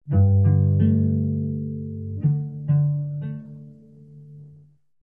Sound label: Contemplative Cello